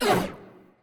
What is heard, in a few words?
Enemy Boss Hurt; bloom minigame